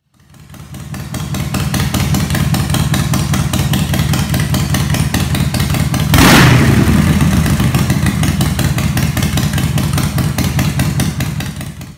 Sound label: Harley-Davidson